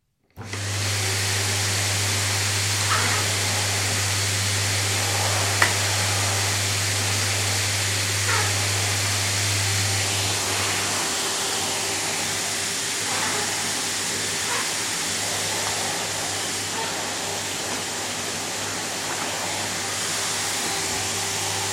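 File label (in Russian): звук работы старого пылесоса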